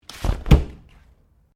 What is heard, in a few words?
Umbrella Open; Umbrella Sound Effects; Objects